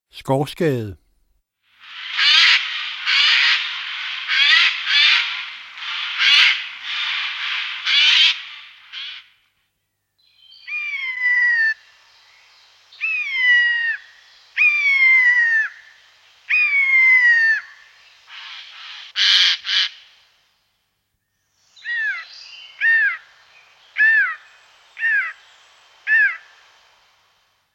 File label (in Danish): Skovskade